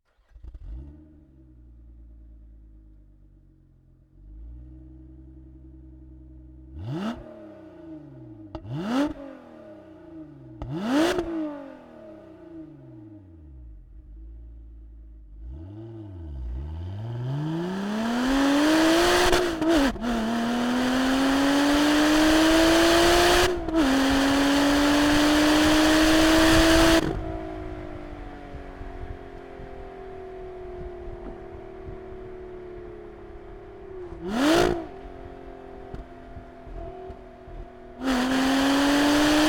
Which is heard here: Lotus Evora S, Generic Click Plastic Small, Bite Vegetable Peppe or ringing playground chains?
Lotus Evora S